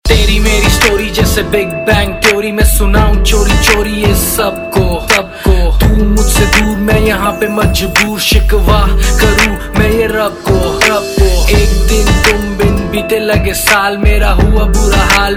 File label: Rap Ringtone; Indian Pop